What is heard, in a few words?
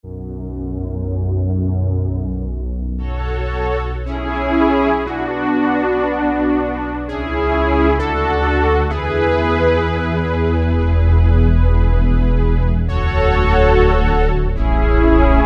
Cello Orchestra, Roland MKS-70